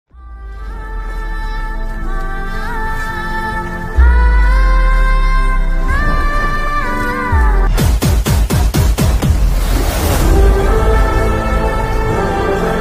kabzaa bgm

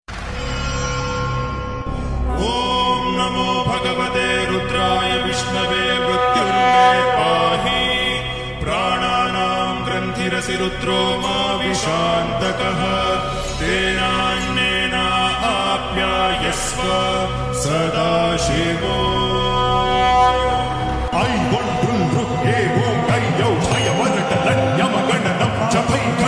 rudra chanting bgm ringtone; vibrant rudra music ringtone; shreeharsha realty show

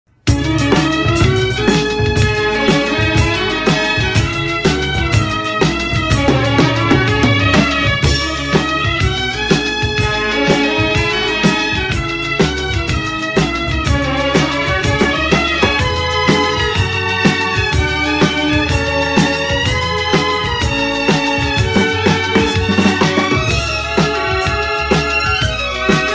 bgm, drums

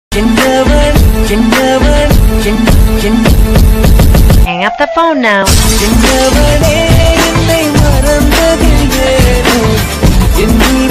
remix ringtone bgm; tamil

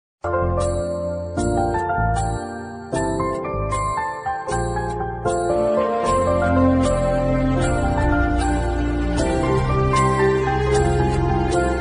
ringtone tune

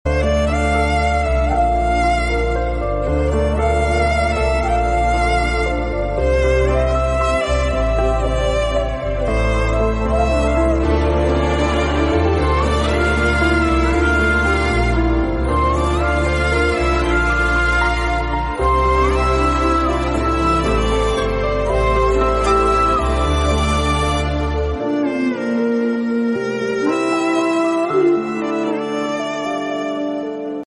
instrumental; ebinezere; instrumentalmusic

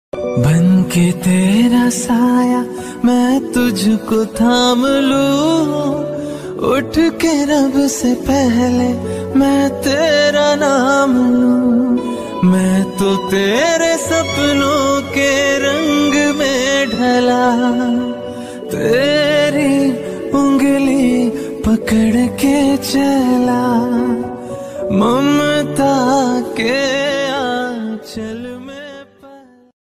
best instrumental ringtone

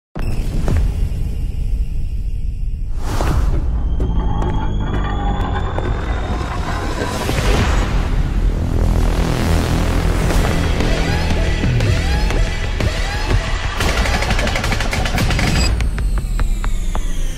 mass bgm, bengali; raavan

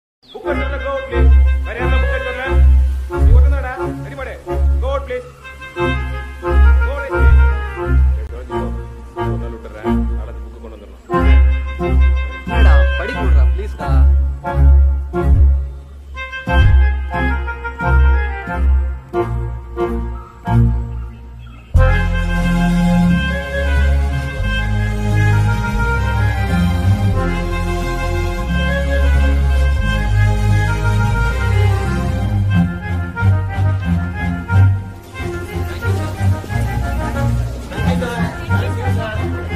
movie bgm; moonu

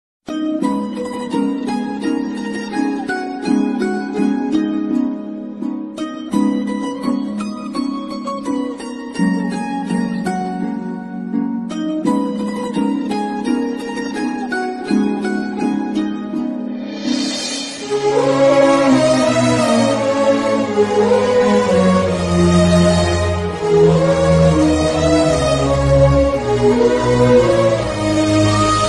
love bgm, telugu bgm; Teenmaar

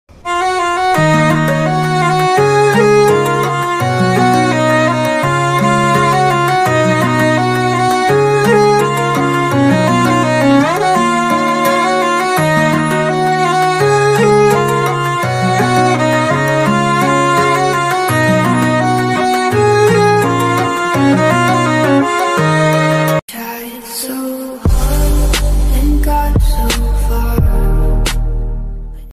ringtone instrumental; mobile ringtone

